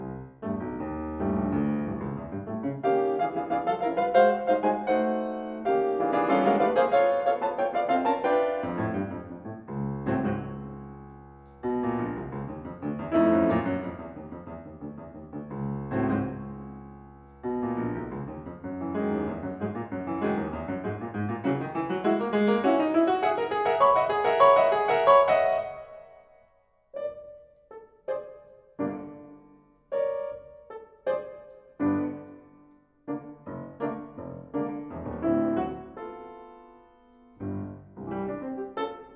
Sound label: violin sonata, Piano Accompaniments; Debussy-violin-sonata-sample; Piano Accompaniments for Advanced Classical Musicians